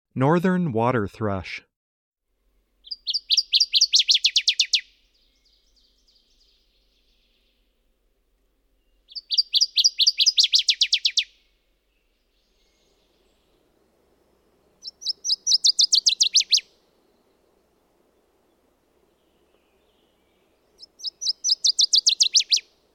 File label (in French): Paruline des ruisseaux